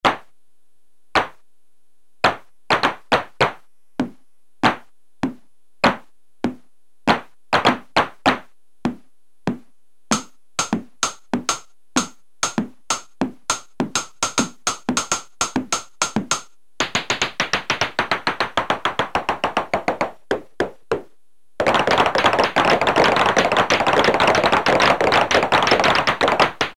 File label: PERCUSSION, Casio CZ-1000; Preset